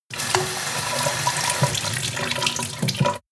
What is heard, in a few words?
sink water pot hard; industrie-wirtschaft; gastronomie